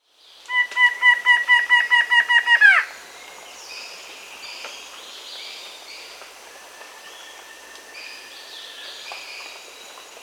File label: Plain-winged Antshrike (Thamnophilus schistaceus), Peru; S. America